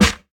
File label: deep steel snare drum sound c sharp key